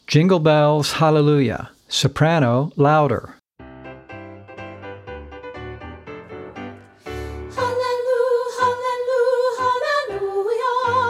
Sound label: SOP LOUDER; practice tracks for singers